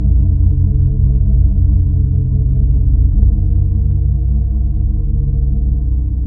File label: bass